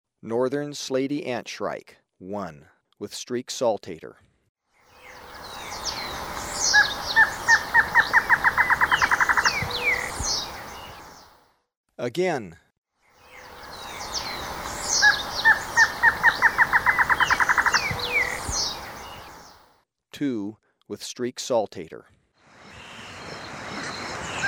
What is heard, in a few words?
Northern (Guianan) Slaty-Antshrike, Birds of Ecuador; S. America